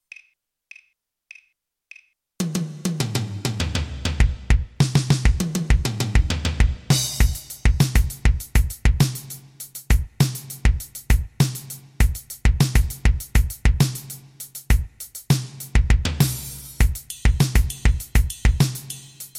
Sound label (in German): schlagzeug etuede; schlagzeug stuecke etueden